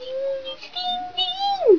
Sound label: sing; valve; elf